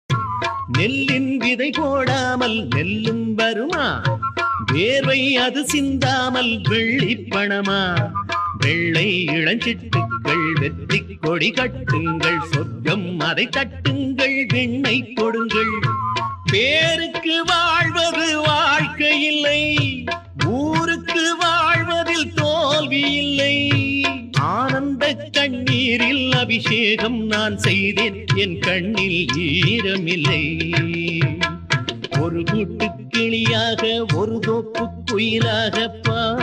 ancient tv music instrumental; exhibition; vanalayam; palladam

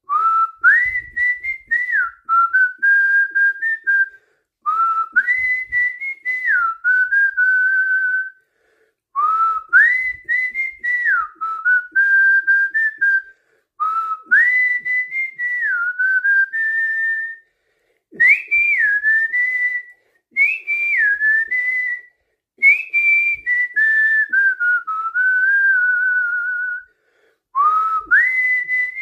whistle ringtone

